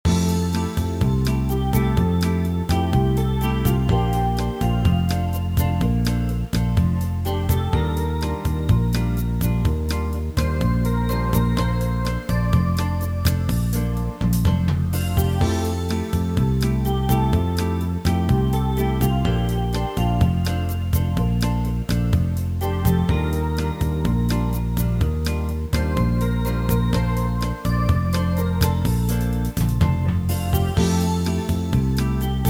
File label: Bossa